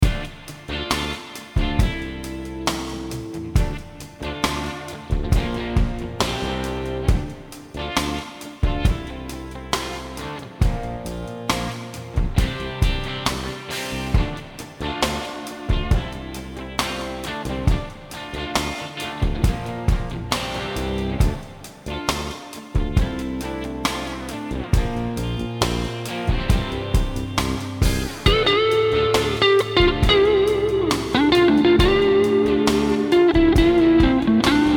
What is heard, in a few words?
D minor jam